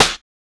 GLASS; Spectrasonics; Metamorphosis; GLASSBABY

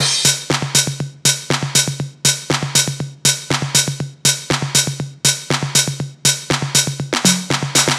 Combo Hat

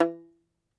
Tenor Banjo, Plucked Strings

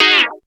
tuners

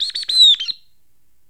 BIRD, bird call; Greytsounds VOL-1; BIRD CALL FX

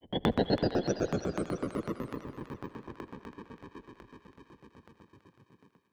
Jittered Noise Build

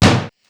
SDS2000 BD, Simmons SDS2000; SDS2000 Factory5

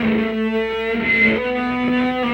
Electric Guitar; Sonic Foundry (Sony Creative Software); Friendly Fire; Serpent

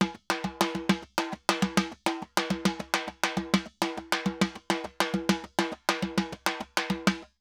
Timba, Festa Latina, Drums & Percussion

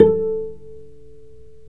STR VC PIZ, Chamber Pizz; Roland L-CD702

